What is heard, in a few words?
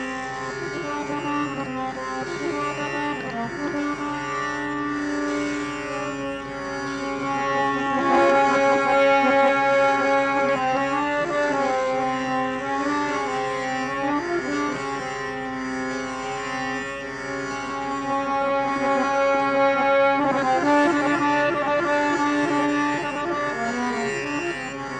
Raag Maroo Tiwar - Harmonium